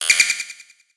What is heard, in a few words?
Weird Sound